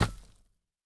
survey charge stick; Rust

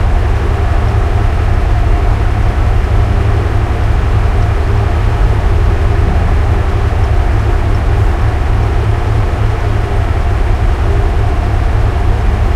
panzer3 engine int rpm 00, tanks; War Thunder

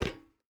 footstep boots metal run; Rust

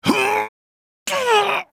terracotta front loop

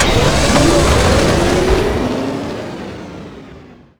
foot lift

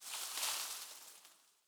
leaf rustle crunchy hard

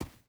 footstep boots sand run; Rust

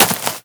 foot leaves, footsteps

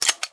slide back; Rust

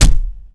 Hit Knight; Civ4 warlords

